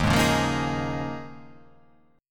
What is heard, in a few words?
D sharp 9th Suspended 4th D sharp; D#9sus4 strummed; JGuitar